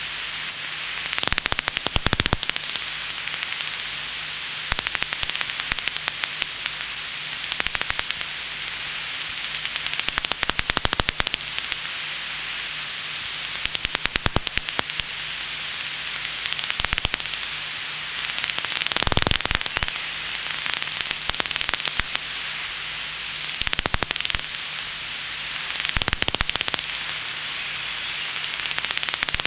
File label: myotis emarginatus; chauve souris; sonotheque